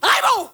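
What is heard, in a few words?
FEMALE RAVE; Spectrasonics; Heart of Africa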